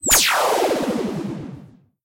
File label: extreme Activate; starburstxxxtreme